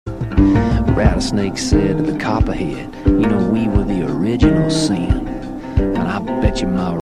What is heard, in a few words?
iphone x remix ringtone